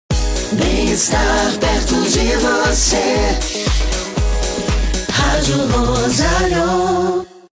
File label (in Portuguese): JINGLE RADIO, vinhetas; shopping; ROSARIO